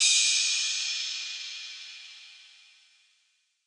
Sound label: Ride; Drum One Shots; Cymbals; Rides; Cymatics; Eternity Sample Pack